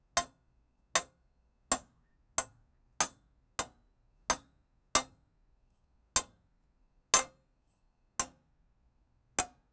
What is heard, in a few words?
metal scalpel tink tiny; medical equipment